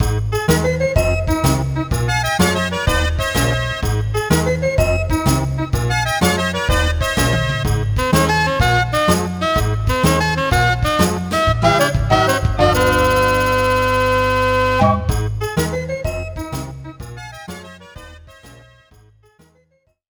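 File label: background music; Machi Unmei no Kosaten Tokubetsu Hen; PSP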